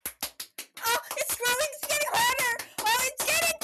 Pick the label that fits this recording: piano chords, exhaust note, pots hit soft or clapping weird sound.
clapping weird sound